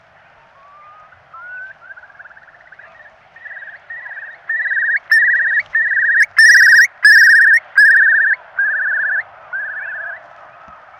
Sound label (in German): Großer Brachvogel